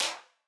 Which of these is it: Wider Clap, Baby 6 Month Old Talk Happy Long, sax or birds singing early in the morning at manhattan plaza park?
Wider Clap